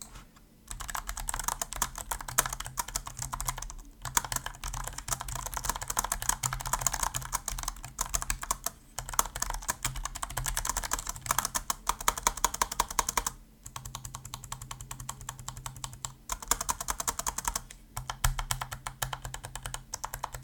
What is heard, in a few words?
corsair k100 air wireless soundtest